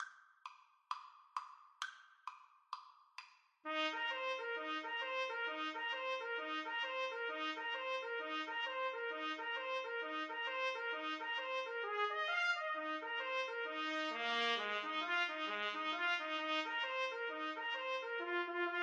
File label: jingle bells boogie trumpet duet